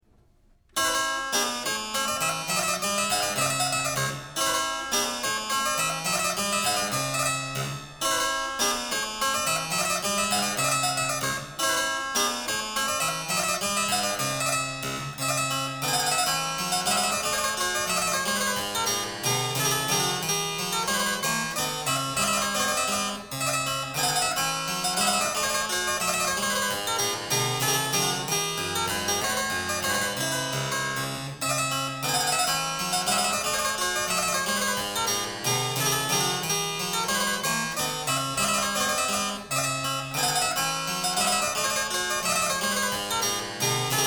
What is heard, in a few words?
Suite In D Menuet; Marchand; Premier Livre